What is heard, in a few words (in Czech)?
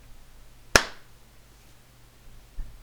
tlesk